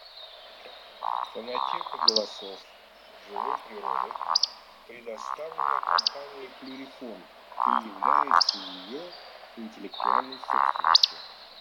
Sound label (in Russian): Вальдшнеп Beccaccia; plurifon